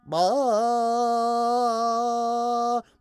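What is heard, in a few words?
ambience China 3D ambience; Rabbids Multiverse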